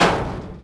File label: elevator closed